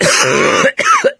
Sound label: cough, mechanic, survivor voice; source engine; left 4 dead 2